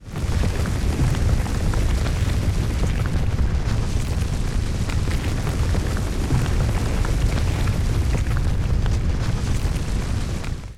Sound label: earth tremor